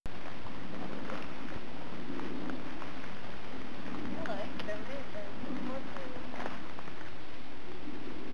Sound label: Light Nimble; Sony